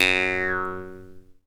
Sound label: JEWS HARP, Earth Tones; Jew's Harp & Spoons